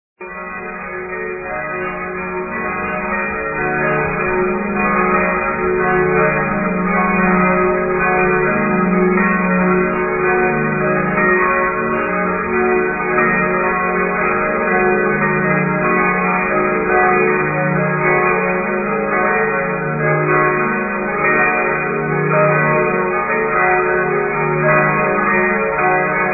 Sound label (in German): Glockengelaeut; Mainspitze